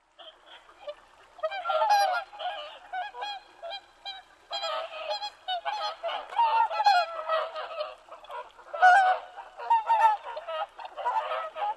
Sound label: oohakuchou